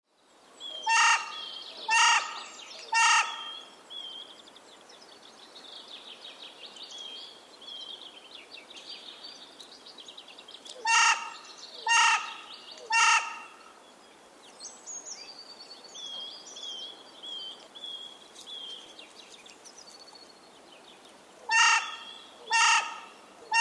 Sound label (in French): corneille noire